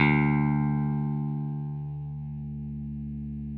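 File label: 6 string bass; Sampleheads; Whole Lotta Country 1 & 2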